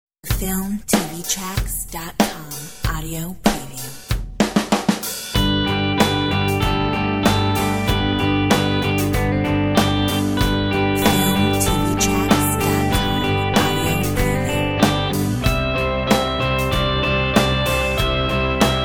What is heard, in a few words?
Rock Band